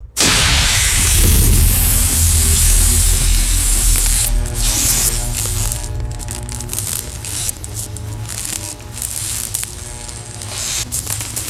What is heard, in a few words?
stylized retro electric sparks and arcs; electricity